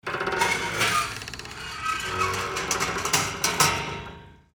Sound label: Old rusty door